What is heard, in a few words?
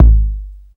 bass drum deep, techno trance drums; Ueberschall; Techno Trance Essentials